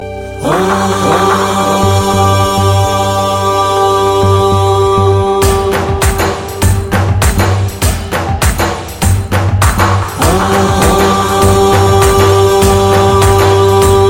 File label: om ganesh mantra; rock